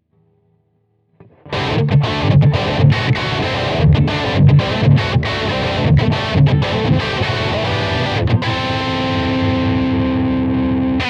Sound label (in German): Alle Pickups Nacheinander High gain